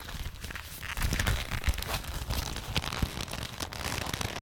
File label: Maneken Move; MiSide